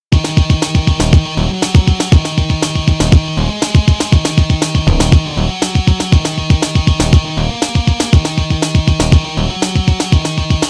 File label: Race; Metal Arms